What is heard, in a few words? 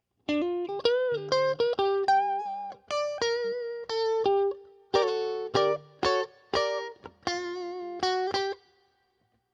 scooped mid middle